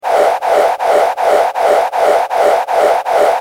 Javelin Flying; stoneagesport

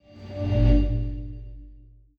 Arcane Beacon; Arcane Activations

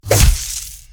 PUNCH ELECTRIC HEAVY, Hit and Punch

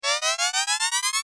Long Acceleration Start; storeagesport oldanimation

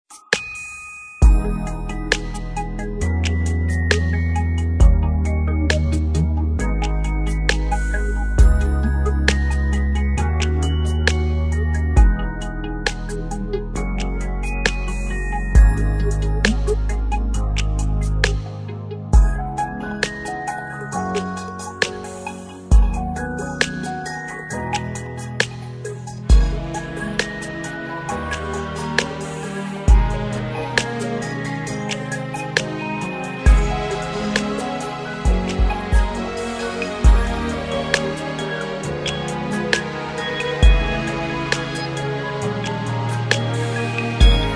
loading BGM

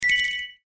get Coins

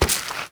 Banana Sand; FruitMaster; SmashFruit

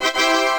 Success; WildAttack

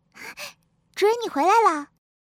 Game Idle Audio

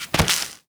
Banana Sand; FruitMaster; SmashFruit

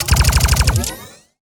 Ion Pistol Burst; Sci Fi Weapons Cyberpunk Arsenal

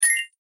planet Die; ShootingSun

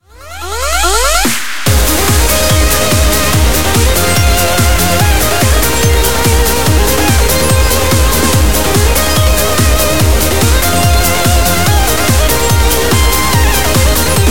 bgm; Hyperspace

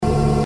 gas; boxing

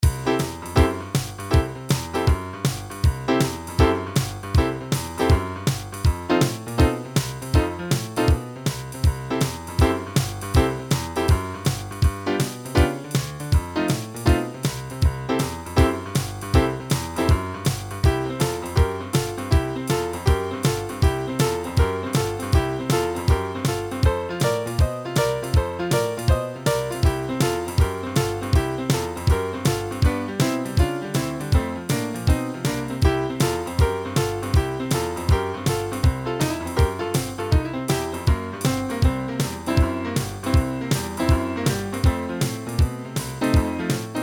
BGM; storeagesport oldanimation

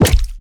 PUNCH SQUELCH HEAVY; Hit and Punch

